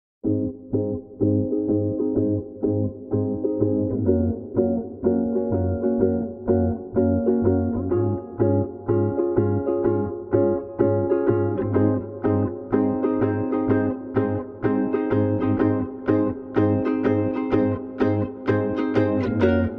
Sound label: Vocals and Ukulele Only